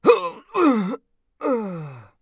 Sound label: death; Escape from Tarkov; scav3